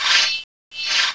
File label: knifes; BaboViolent 2